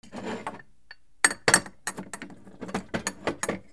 KITCHEN

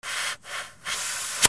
CARS

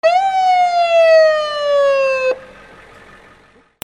VEHICLES

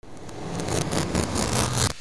RIPPING

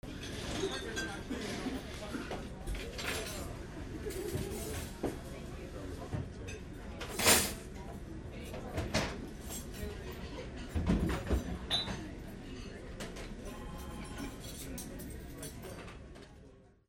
AMBIENCE

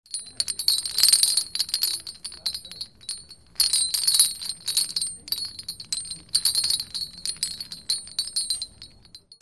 BANGS

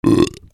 CARTOON

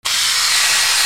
BATHROOM

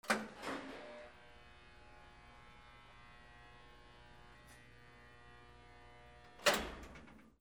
INDUSTRY

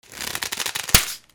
BREAKING

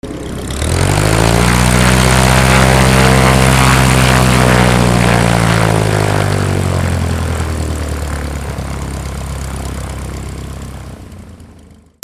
flight

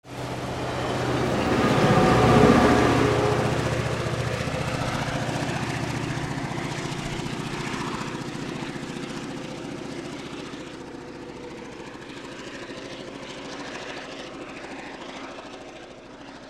TRAINS

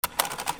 OFFICE

